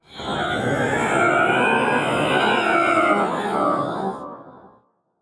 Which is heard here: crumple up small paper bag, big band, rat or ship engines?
ship engines